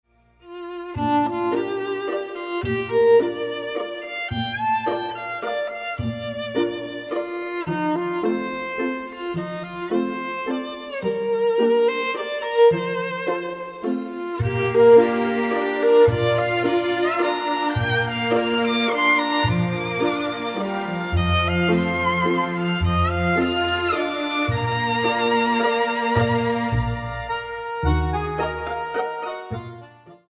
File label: Waltz; Melodia